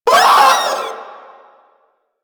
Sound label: Proto Infested Vocal Short Attack, Enemies; Warframe; InfestedNinetyNine